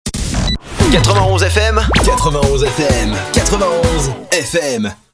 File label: Sweeper Classic, Jingles